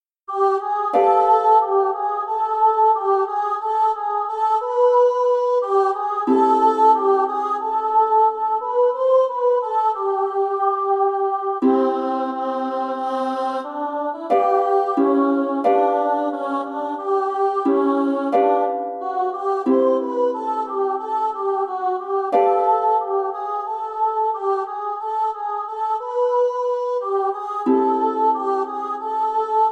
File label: ukulele